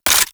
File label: AK47 Low Clip; Tenno